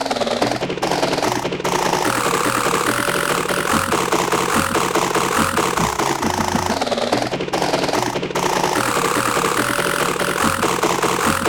helicopter

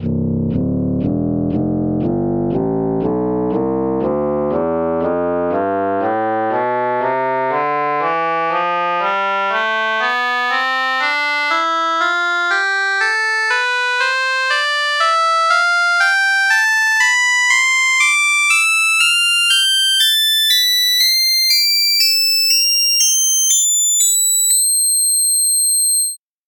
shenai; tonleiter

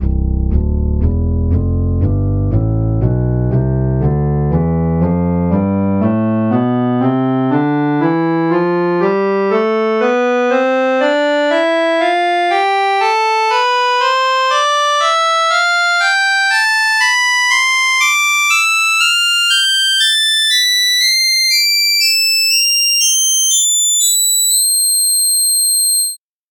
reed organ; tonleiter

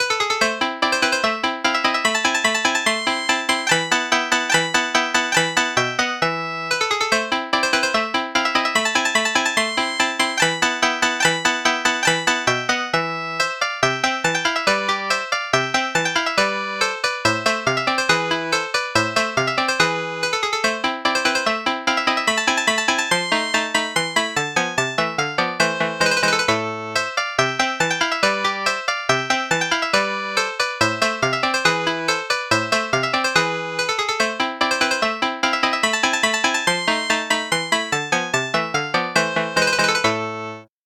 koto

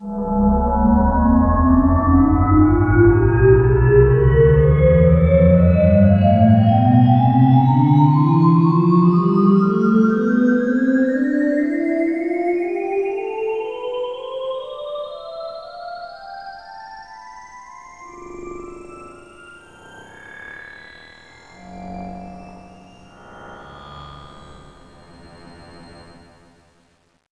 goblin tonleiter

